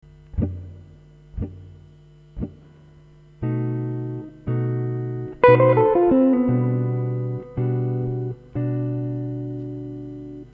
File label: Lick